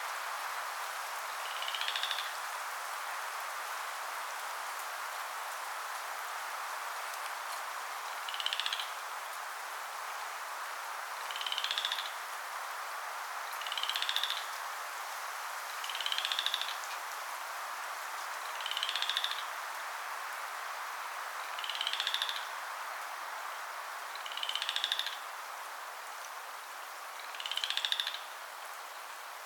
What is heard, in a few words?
Upland Chorus Frog, Falls Lake NC